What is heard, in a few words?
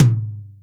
Real T2, Boss DR660, mt; drum machine